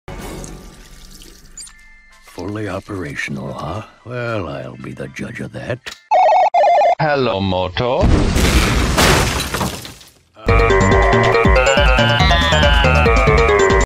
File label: hello moto ringtone motorola razr